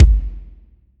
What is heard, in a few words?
mid heavy kickdrum g sharp key, Royality free kickdrum sound tuned to the G# note